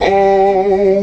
oooh g2, Vox

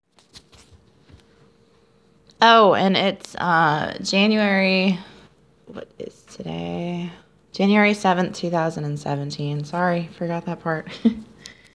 eeeep

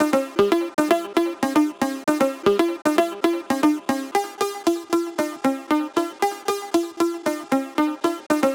synth; Pony Fan Music Remix Stems

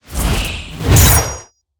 Monster; Zenless Zone Zero

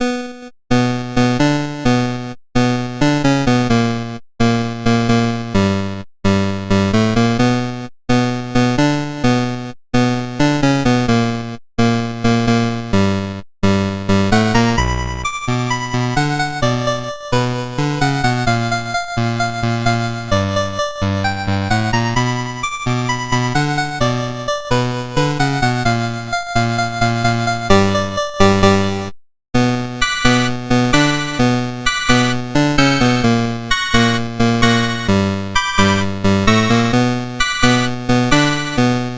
Chiptune

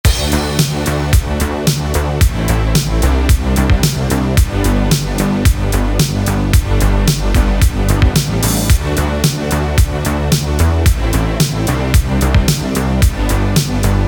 synth

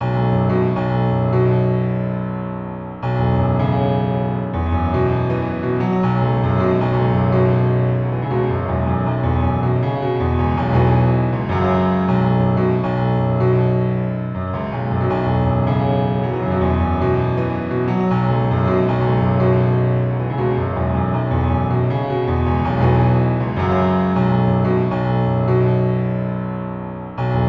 Melancholy piano

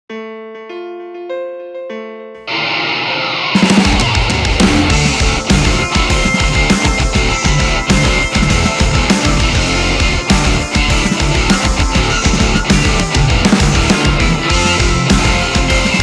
Short Song

